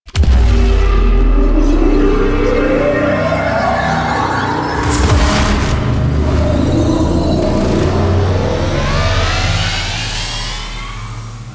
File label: Activate Light; Warframe; The New War